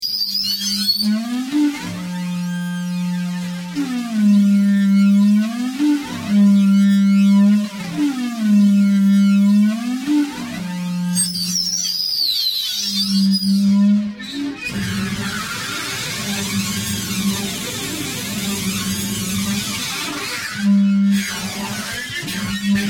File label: feedback; industrial noises; Feedback #2